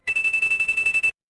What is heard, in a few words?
office ringtone